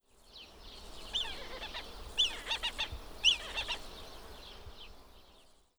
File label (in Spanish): Milano negro Milvus migrans; minato negro